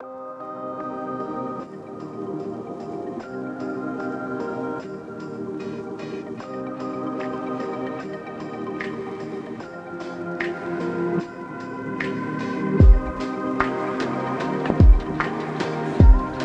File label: Gaming Soundscape